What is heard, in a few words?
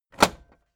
tape deck close heavy